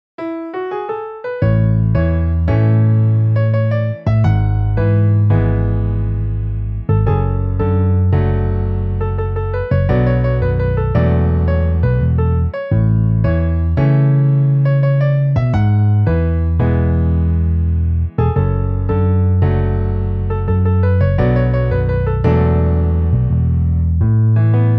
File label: Piano Bass MIDI